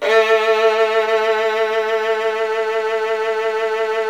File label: SOLO VN; ILIO; Kirk Hunter Virtuoso Strings VOL-1